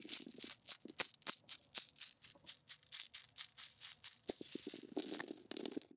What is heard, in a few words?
Coins Change Jingling Motion Metal; Nova Sound